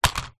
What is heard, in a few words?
leisure toy drone syma x5sw down on table; zapsplat